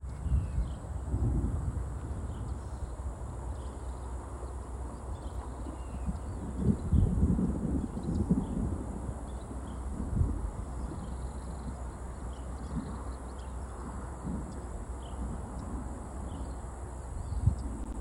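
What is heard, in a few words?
thunder before rainstorm, field recordings; scout island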